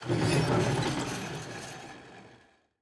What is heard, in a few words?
Chomper Slide; Puzzle